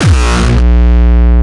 kick; shock. Ultimate Kicks vol. 2

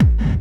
Hard Kick

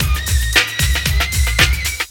triphop, audioloops

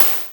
Hat Open, Roland System-100; Drum Samples

